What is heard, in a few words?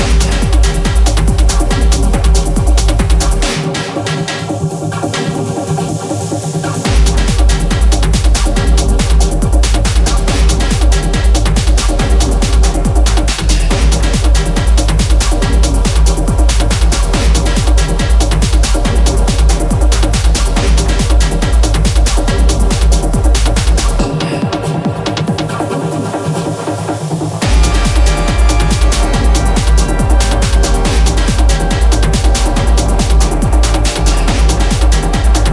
Funk for Punk